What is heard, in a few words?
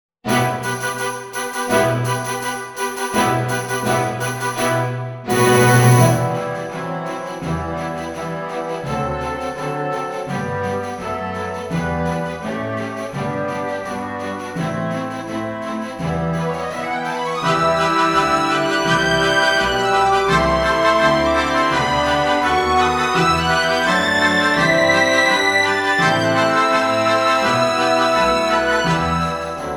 cowboy accomp